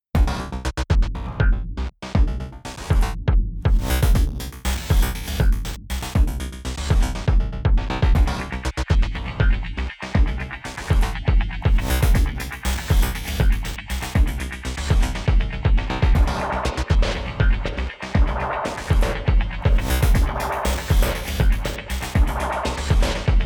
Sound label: Ambient; Assault Spy